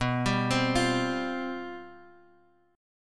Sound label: C Major 7th Suspended 4th, guitar